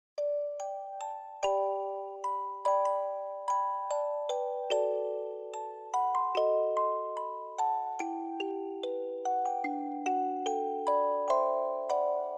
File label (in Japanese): Love Letter オルゴール ver.